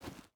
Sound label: footstep boots stones jump start; Rust